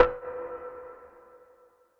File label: Bell Lo Charred; One Shots; Drums; Ableton Live 12 Lite; Core Library